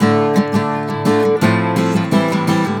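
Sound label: guitar, Celtic Flavours; USB Soundscan vol.42; AKAI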